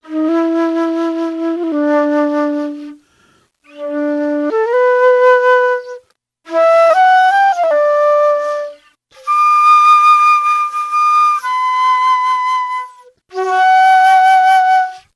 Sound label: flute strained, Jazzmatazz loops